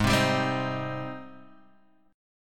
G sharp Major 7th G sharp

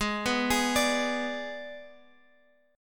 G sharp Diminished G sharp, Guitar Open G; 18,16,18,19,x,x

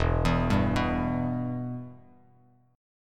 E-Minor, Bass Standard; arpeggiated; JGuitar

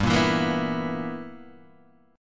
B Major 7th Suspended 4th Sharp 5th G

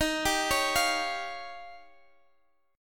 Eb 9th Eb, Guitar Standard; arpeggiated; chord

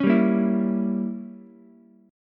Db Diminished 7th, Ukulele; strummed; 6,7,6,7

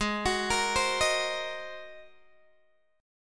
G sharp 9th, Guitar, Open G; x,13,16,15,13,13; down; chord shape

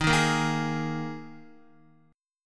D sharp Suspended 4th, Guitar; D#sus4 strummed; JGuitar

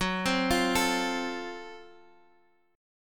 Gb Minor Major 7th Flat 5th Gb, guitar; arpeggiated; x,x,4,5,6,5; JGuitar

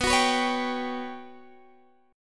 G Major 7th Flat 5th

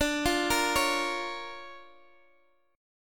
D Minor Major 7th Sharp 5th, D, down; arpeggiated

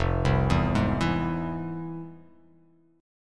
F Minor Major 7th, F; 1,3,2,1,4,x,x,x

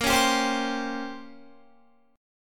Bb Minor 6th Add 9th Bb down Guitar; Bbm6add9 strummed

